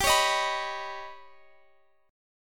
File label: D sharp Augmented 7th G